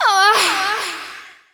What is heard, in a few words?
female orgasm